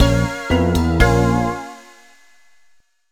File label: Lose, Losing a level; Warped Mansion